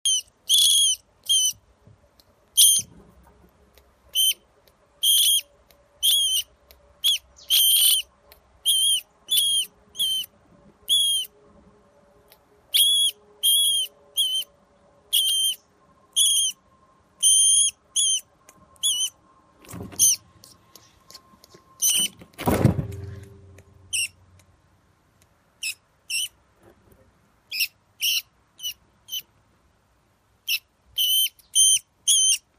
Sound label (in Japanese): dobu naki goe